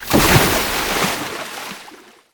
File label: water wave